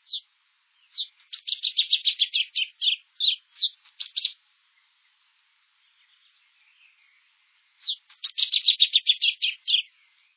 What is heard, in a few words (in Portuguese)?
pia-cobra